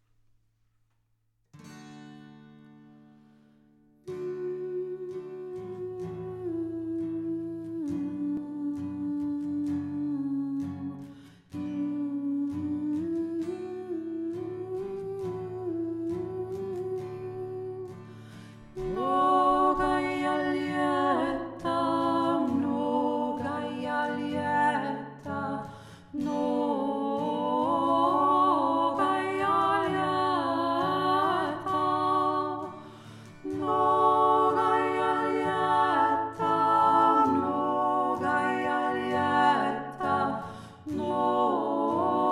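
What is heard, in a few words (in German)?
mnogaja lietta dreistimmig, russisches Segenslied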